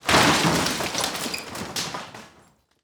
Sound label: construction debris